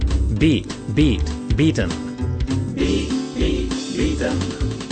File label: beat